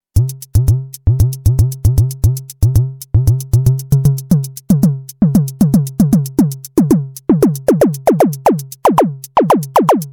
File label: Neuron Zapping; Audio Damage